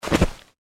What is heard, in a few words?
feral ghoul swing; npc